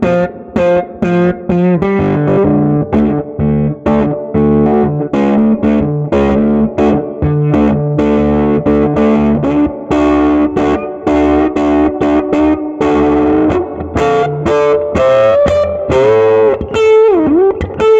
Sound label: reverb riff with some lead, amps